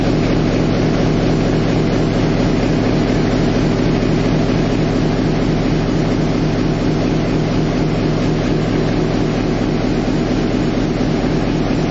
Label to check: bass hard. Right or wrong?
Wrong